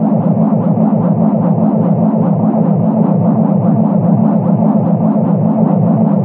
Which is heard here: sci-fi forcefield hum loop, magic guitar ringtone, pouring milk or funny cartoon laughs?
sci-fi forcefield hum loop